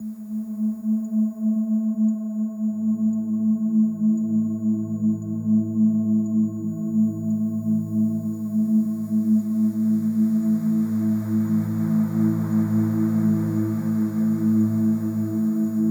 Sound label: Binaural Tones 40 Hz Brain Waves Binaural Beats